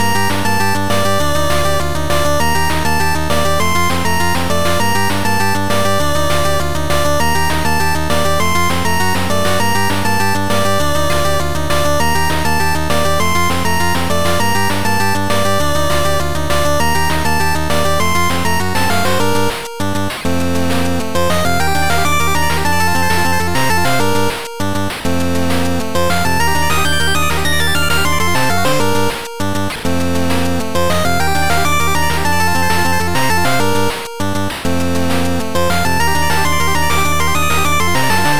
8bit

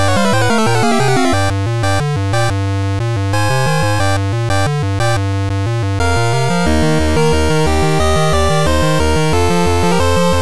8bit

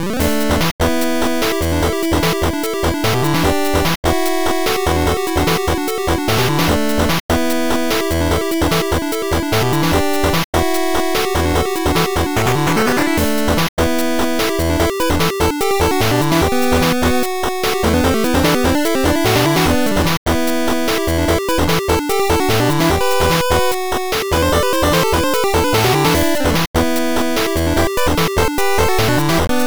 8bit Battle Groove

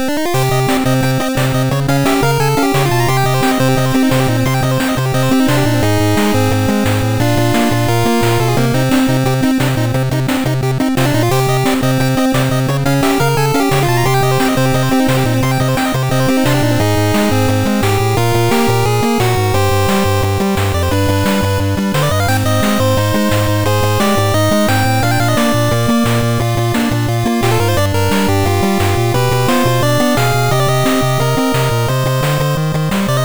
8bit Battle With Monsters

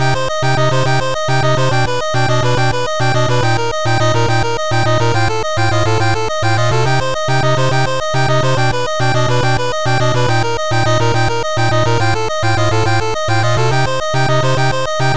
8bit Right Before The Final Battle

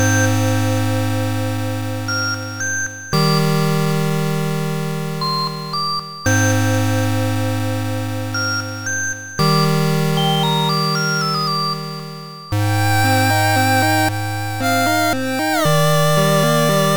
8bit Snowy Town

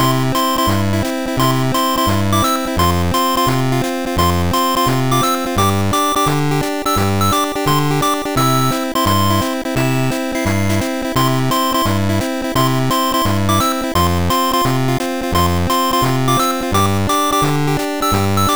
8bit Everyday

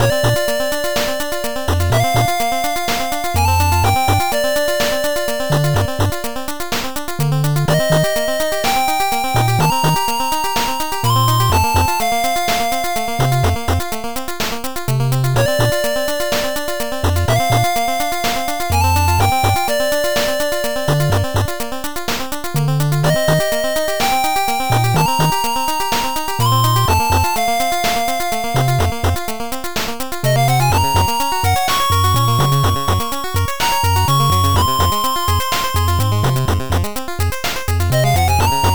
8bit

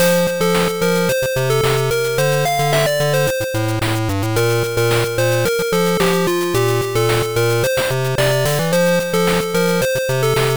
8bit Warm People

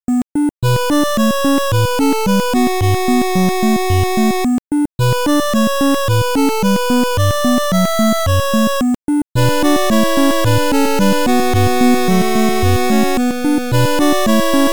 8bit

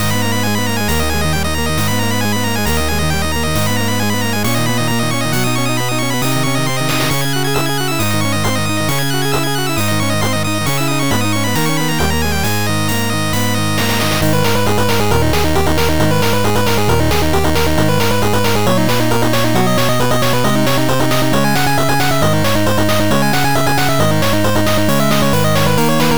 8bit

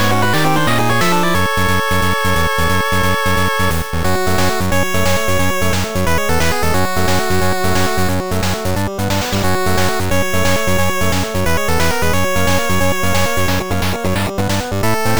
8bit

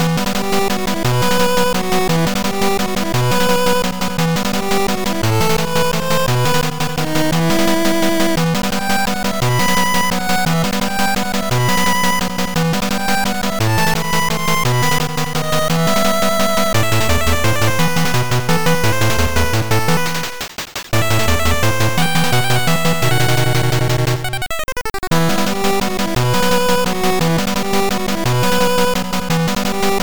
8bit

